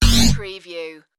sci-fi game menu slide

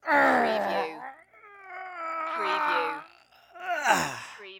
Grunt Strain Male

